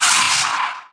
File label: Ice; lego ninjago rise of the snakes